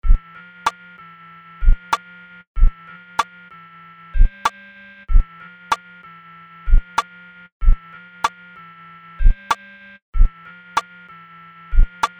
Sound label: Sinewave